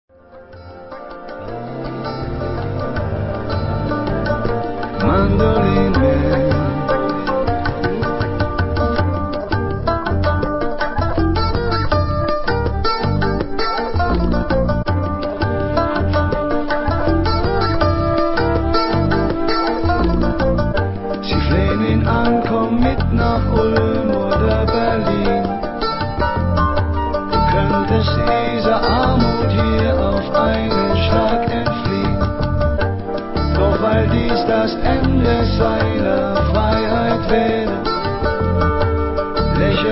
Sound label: mandolin man; morbusfidibus